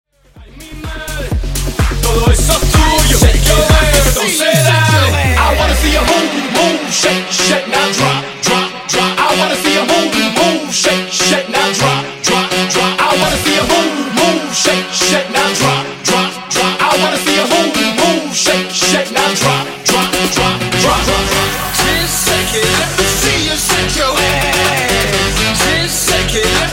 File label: Funk Bootleg 126Bpm